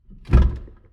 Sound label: Trash Can; Zoom H1